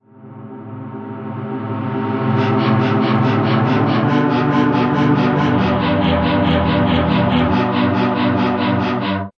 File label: reverse intro pads; SYNTH AND ELECTRONIC